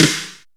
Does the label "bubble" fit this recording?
No